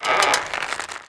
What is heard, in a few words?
bridge rope squeak